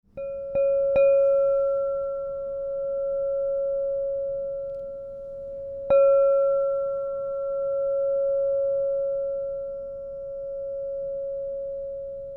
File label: Crystal Tones Singing Bowls